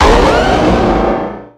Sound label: pokemon cry